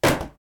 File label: Box Impact